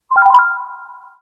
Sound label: Refraction, notifications